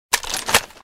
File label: ak47 draw; EMXArms; draftsman